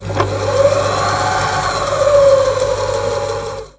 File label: sewing machine spin; Electric sewing machine spinning motor sound